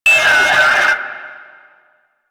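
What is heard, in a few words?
Military Backpack Shutdown; Objects; DE; Warframe; Storage Container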